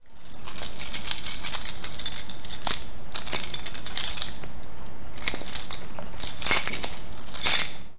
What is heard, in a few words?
keys